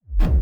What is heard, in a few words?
BD Gate FX, Bassdrums; Vengeance Samples